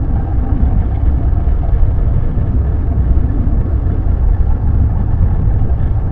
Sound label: pirate battleship idle; ambient; Stellaris